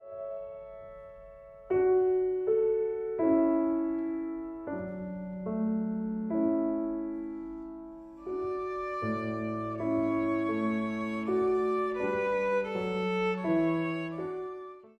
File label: VIOLIN